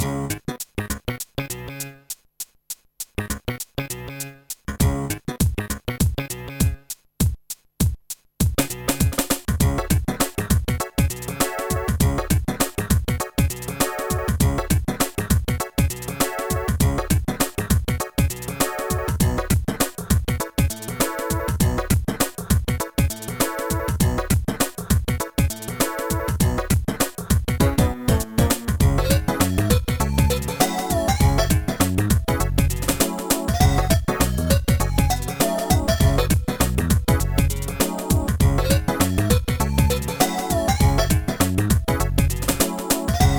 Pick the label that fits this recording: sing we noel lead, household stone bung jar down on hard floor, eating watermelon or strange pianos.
strange pianos